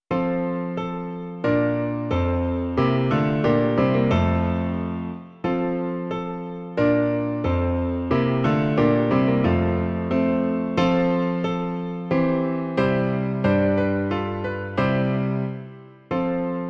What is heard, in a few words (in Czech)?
Ó Ježíši, ty jsi z vůle Boží - klavír; Klavírní verze